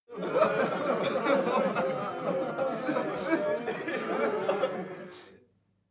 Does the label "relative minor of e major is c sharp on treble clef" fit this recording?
No